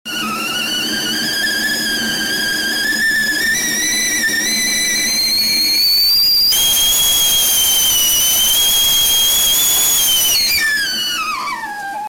teapot boiling

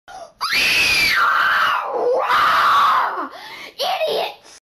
rage kid; sonic

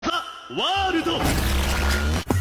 time stop; jonathan

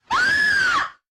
scream

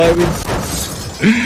deep bottle breaking

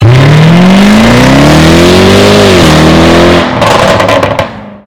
spatial super car revving

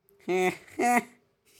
eh eahh

